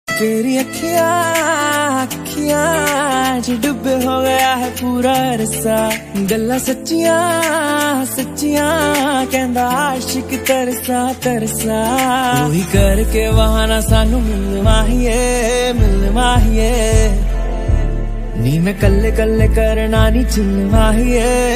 Song, ringtone

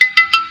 Infinix Note 10 Notification

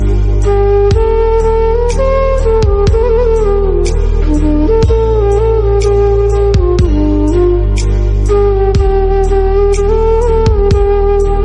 Song Flute

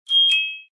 Huawei Sms Tune

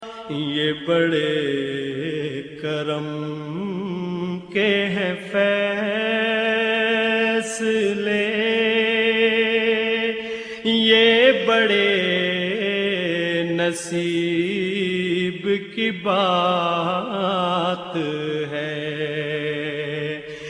Famous Islamic Naat, ringtone

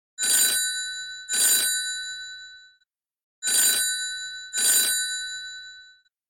Huawei Enjoy 60X, ringtone

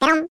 Samsung Sms; Yoshi Tongue

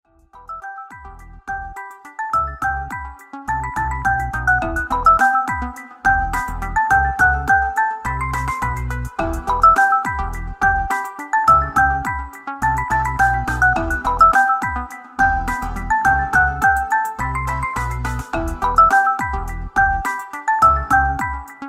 Vivo S12 Pro

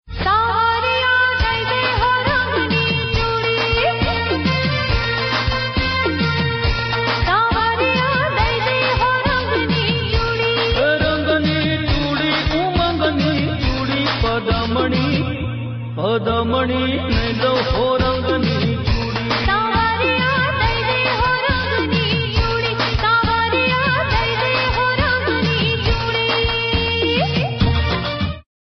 Tamil Bhakti Ringtone

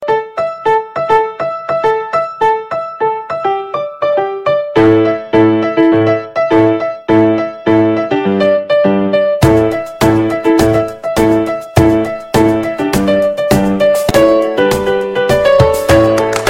Clicks Piano; Vivo

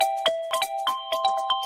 Xiaomi Redmi Note 11 Pro Plus Notification

